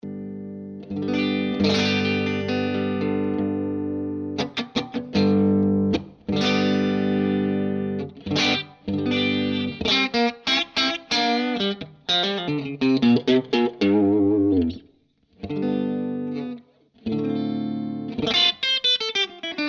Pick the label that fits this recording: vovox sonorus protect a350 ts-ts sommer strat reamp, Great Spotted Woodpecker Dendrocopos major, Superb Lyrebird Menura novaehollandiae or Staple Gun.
vovox sonorus protect a350 ts-ts sommer strat reamp